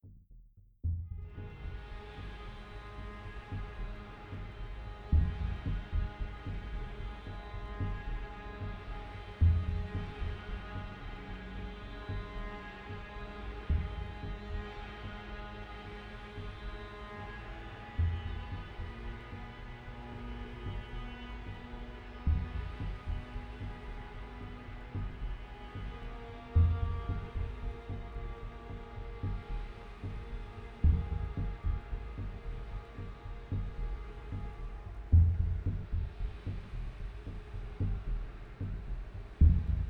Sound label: Doom Underscore